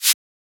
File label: Shaker; FLPRO